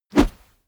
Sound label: whoosh heavy fast; swooshes-swishes